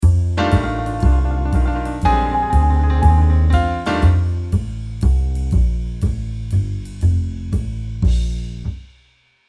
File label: tremolo